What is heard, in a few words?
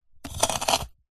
nature ice block sharp knife scrape